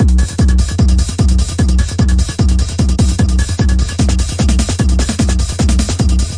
150 bpm stereo trance tekno, drum loops and breaks; 141 to 160 bpm